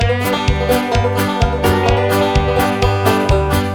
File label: Country, ringtones; Google; NewWave Labs